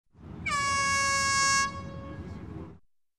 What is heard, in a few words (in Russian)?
gorn na futbole